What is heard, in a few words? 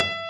jazz E5 Quarter note